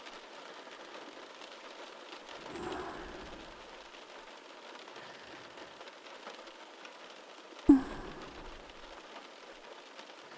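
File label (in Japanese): 安眠用 雨音と寝息; 押し掛けサキュバスママはなんでもしてくれる; サキュママの寝息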